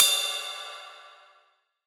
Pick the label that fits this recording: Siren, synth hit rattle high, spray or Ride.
Ride